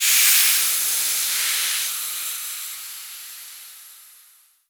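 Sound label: vehicle tire air hiss; Explosions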